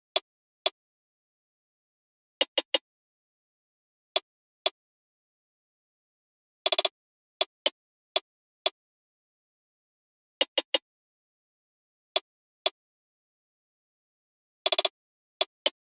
hat loop 60, Drums; Pop; Hat Loops; Slate; SD PASADENA Pop Sample Pack

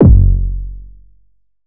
808 one shot F#; Slate Digital BASSHOLE Sample Pack

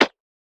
perc lofi; Hip-Hop; Drum One Shots; SD CAICOS Hip-Hop Sample Pack; Slate

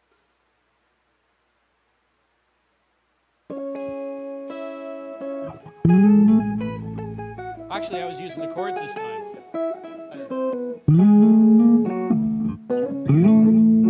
Strings

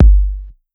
Kick Clicky, 808; 808 Kicks; Slate; Vintage Drum Machines Volume 2 808 Edition

